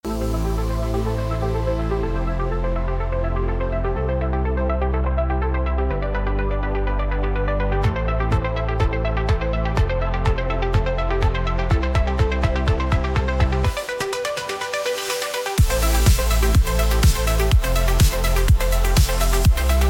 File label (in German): Tanzende Eule synthwave